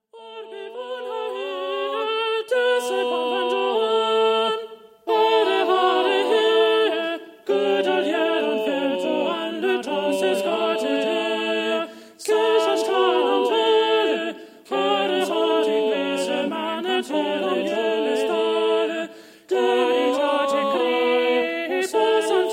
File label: motet